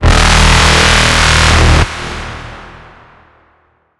horn; reaper

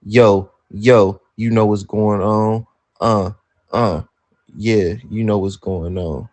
rapping

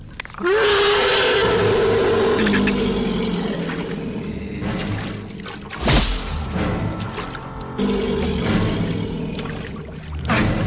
predator war cry

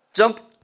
jump

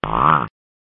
won't start fart

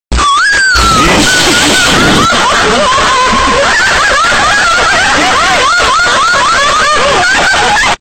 zap

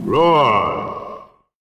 roar

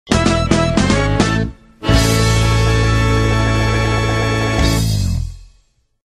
seven note vaudeville fanfare

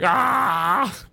ahhhhhhhhh

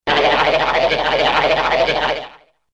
chorus voc fx

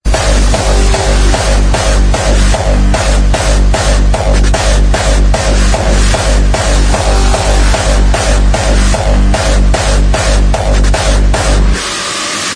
hardstyle drop

